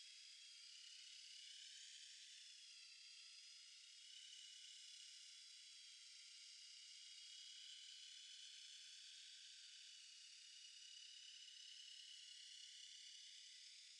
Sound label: DRONES